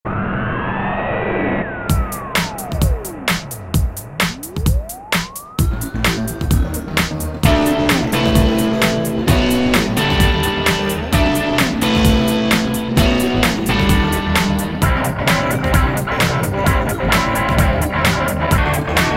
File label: untitled Techno Rock